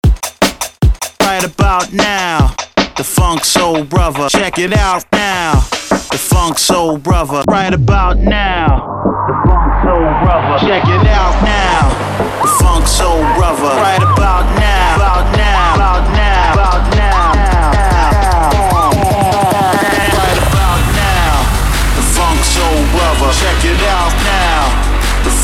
Transition

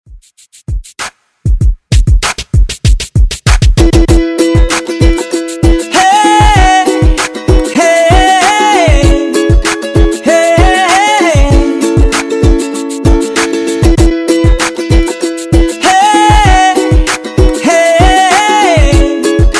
Pop Redrum

